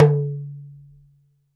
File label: Talking Drum tone; E-MU Producer Series Vol. 7 – Old World Instruments